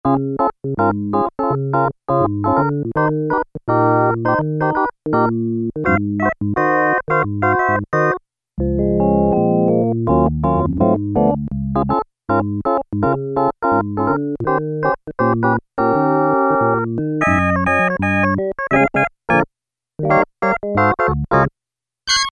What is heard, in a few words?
E.ORGAN, Yamaha DX7